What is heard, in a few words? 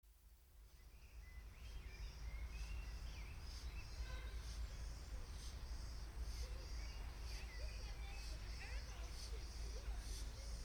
steam train, bois de boulogne